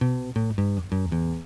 EATEN

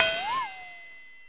mid twang; toon

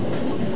BOWL

